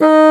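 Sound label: bassoon D#4; East West - Global Sounds; WOODS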